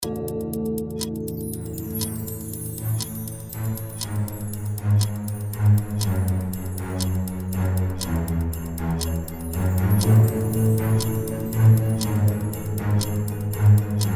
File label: New Year Countdown Clock